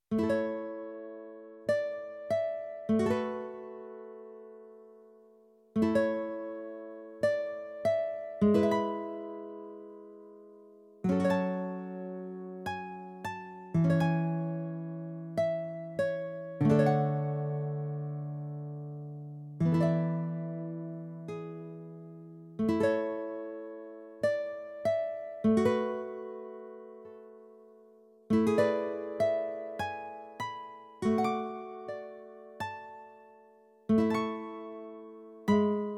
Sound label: Guitar